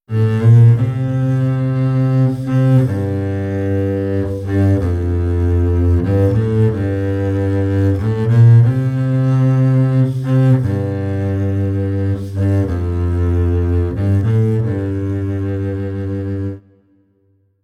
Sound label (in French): Contrebasse